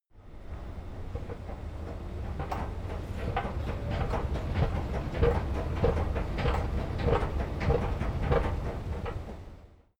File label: escalator short